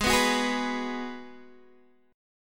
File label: G sharp Minor 7th G sharp, Guitar Open D